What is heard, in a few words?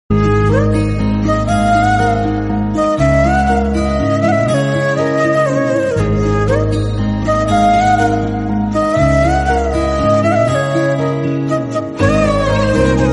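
sad background music; kaththi movie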